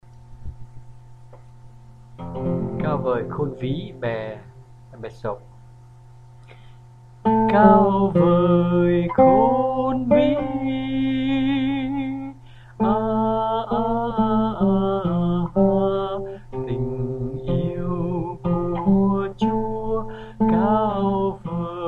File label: Soprano1